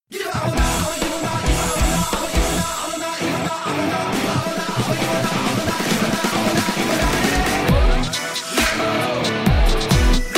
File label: Fight Theme Music Bgm Ringtone; Remo; Nurse; Tamil